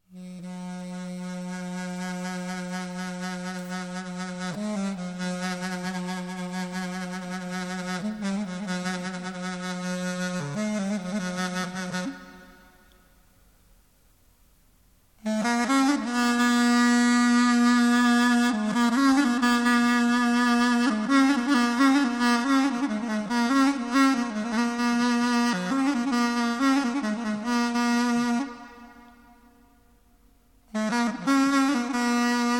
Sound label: Solo Duduk